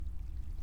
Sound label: Hydrophone